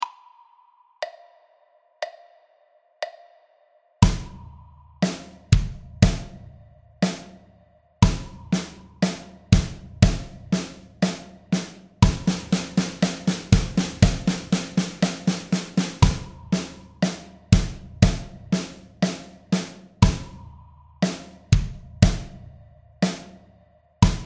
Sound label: 4 way, drum